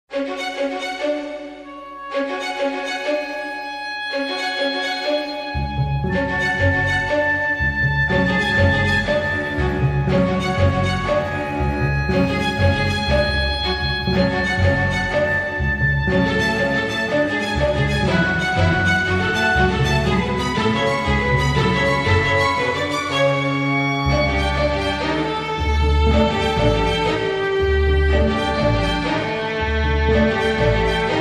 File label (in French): suspense